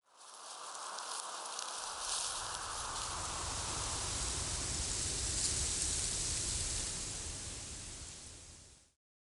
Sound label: wind leaves blow light